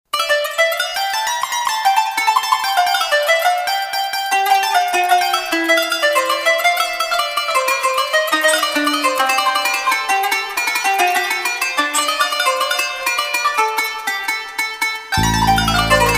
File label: canun; triton extreme